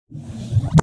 Multimedia Internet CD-Rom Flash Wipe Cartoon

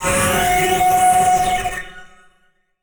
Transition Distortion Power Source; Adobe; Production Element

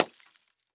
Crash Light Bulb Smashing On Concrete; crashes; AdobeCC

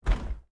Household Freezer Door Closing

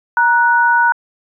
dtmf tone #

